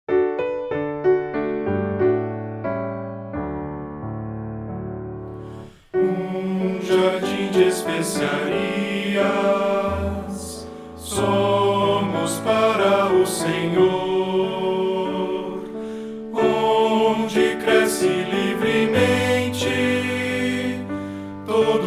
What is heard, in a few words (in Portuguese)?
voz piano